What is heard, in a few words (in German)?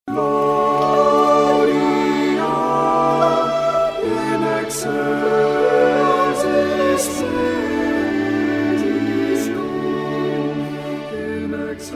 Motette Gesamt